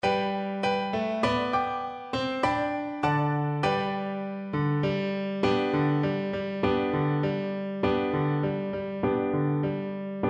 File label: labe igi orombo FL kar, Flute